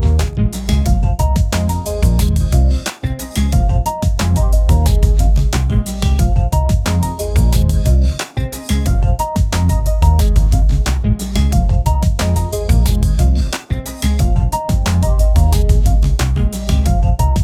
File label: energetic funky groove wi, upbeat